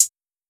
Hi-Hat

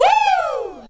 "Whoow", Vox

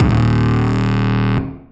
Metal 808; 808s

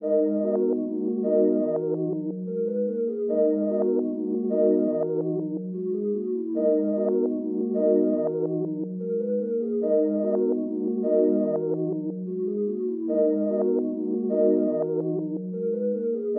ocean drive 147 bpm

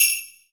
TAMZ, Percs

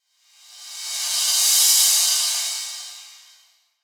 reverse crash; Sfx

